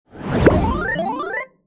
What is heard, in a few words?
level up bonus sequence; Floraphonic